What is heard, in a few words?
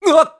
Slide; Link sliding downhill; zelda sounds; Skyward Sword